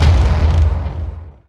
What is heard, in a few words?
Hits & Explode